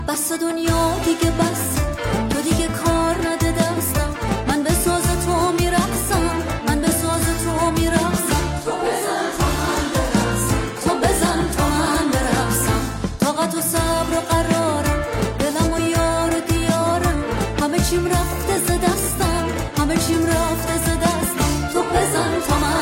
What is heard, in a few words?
music ai famale singer base; rondmusic